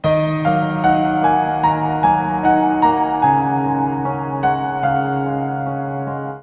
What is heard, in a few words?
piano angel, ambience; dod1